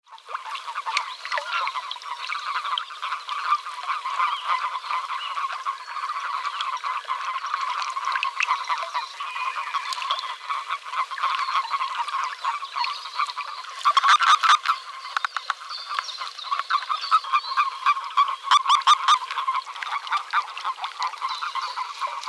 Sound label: Bufo bufo; Guenter Tembrock; tierstimmenarchiv